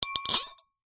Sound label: wine glasses x3 pick up one handed clink; household